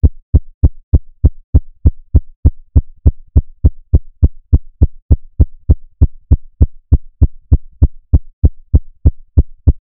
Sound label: heart beat; from spongebob